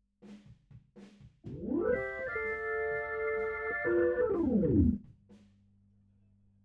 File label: HAMMOND BOT